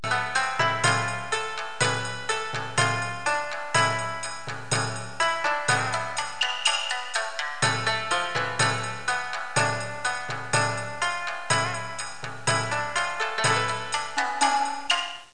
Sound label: music